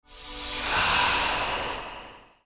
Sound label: miracle healing